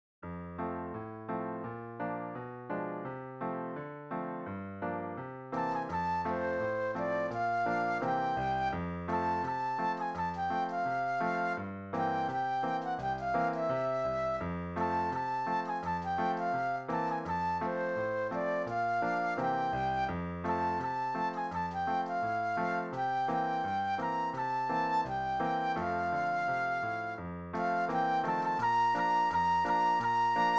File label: Flute Solo